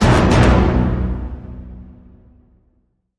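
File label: Hit Orchestra Tutti Double B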